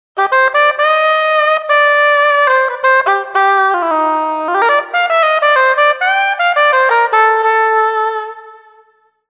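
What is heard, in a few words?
Soprano Sax; TURBO VL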